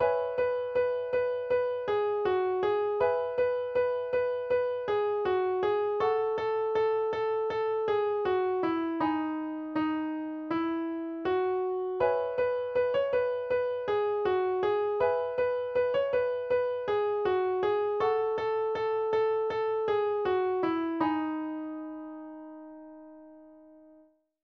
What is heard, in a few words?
instrumental